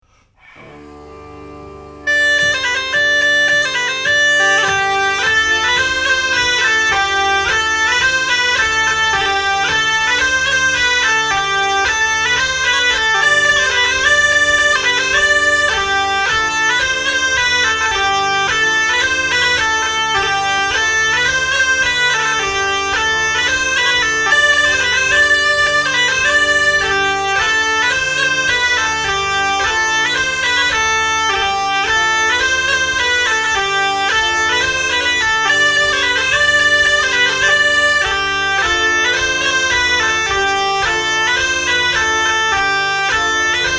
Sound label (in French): Polka piquee Instr Sol sans arret